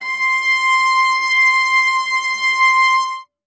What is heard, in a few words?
string acoustic, cello